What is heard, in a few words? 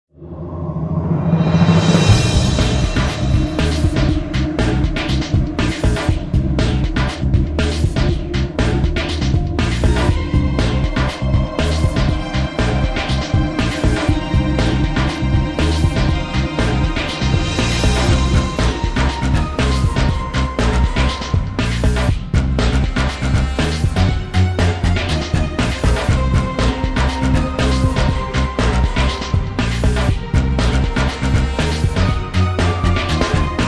clock; maplestory